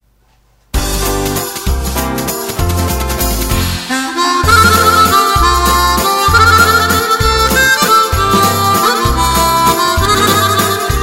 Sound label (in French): valse version harmonica